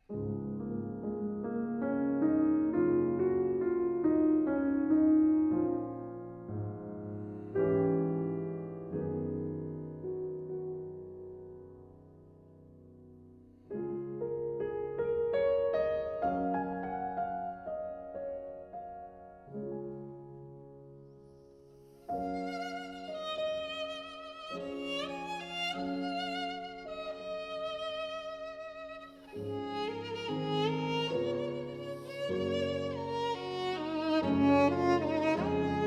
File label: Romance, violin and piano; Delius